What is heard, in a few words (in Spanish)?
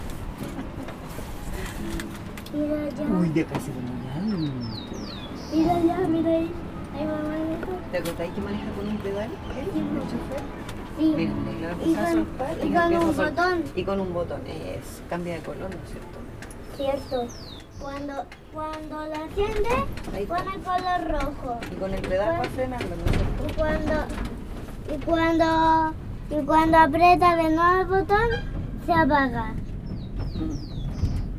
ascensor reina victoria valparaiso